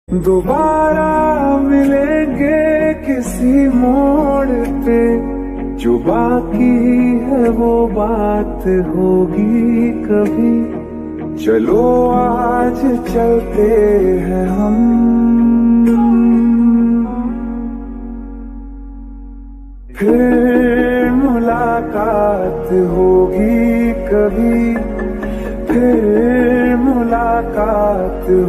Sad Breakup Song

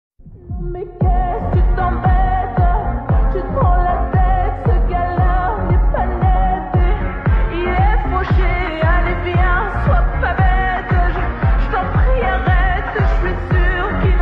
Arabic Dubai Remix Ringtone

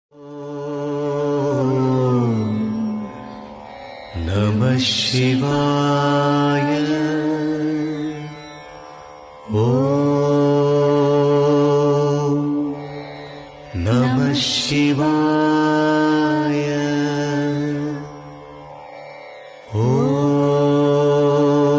Om Namah Shivaya Ringtone Male Voice; ringtones

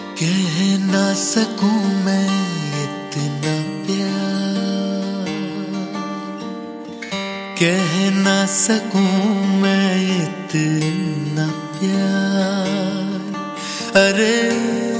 New Hindi Song Ringtone

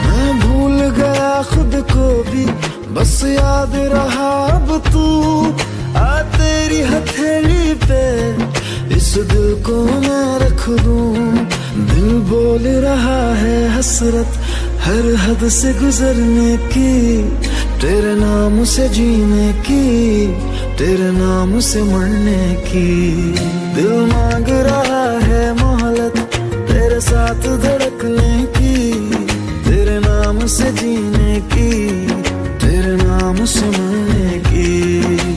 New Hindi Song Ringtone

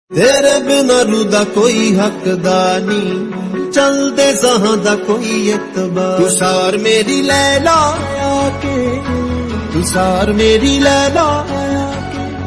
Punjabi ringtone

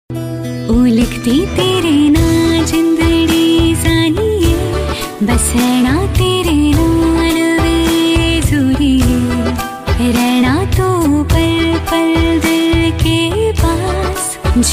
Female Ringtone

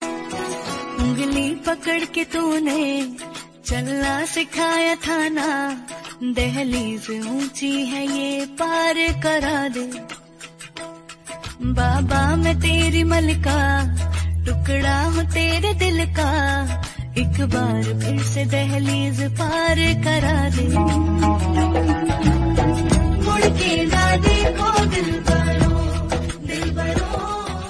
Female Ringtone

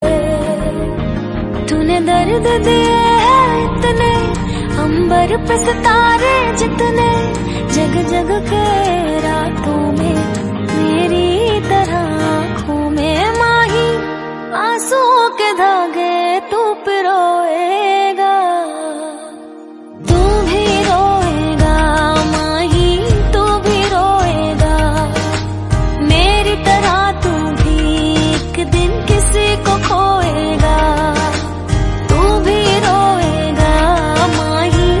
Sad Female Ringtone

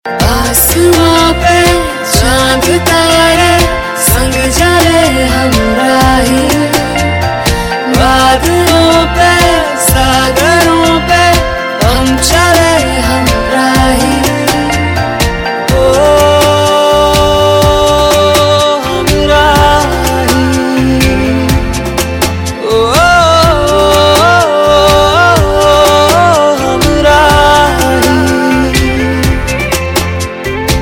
Hindi Song Ringtone

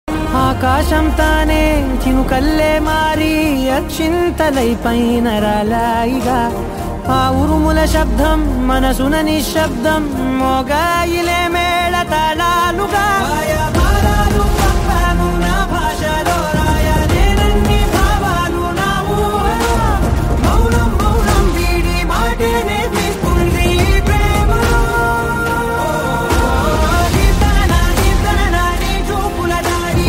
Telugu Ringtone

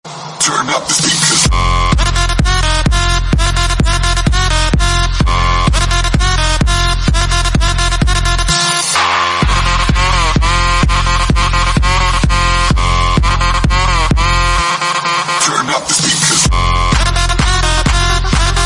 Remix Music Ringtone; ringtones

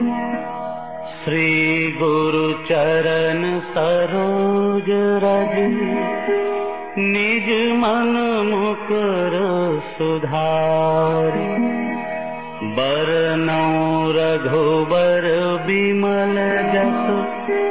Hanuman Chalisa Bhajan Ringtone

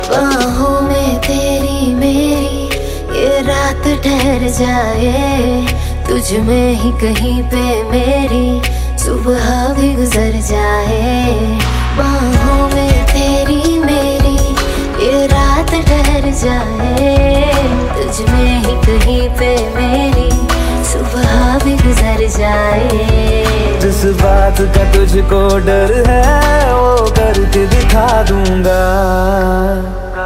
New Romantic Song Ringtone

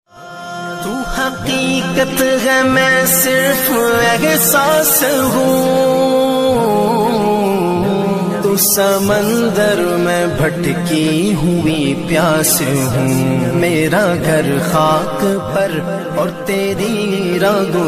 Naat Sharif Ringtone

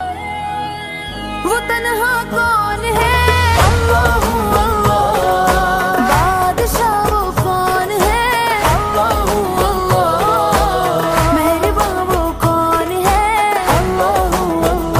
Islamic Ringtone

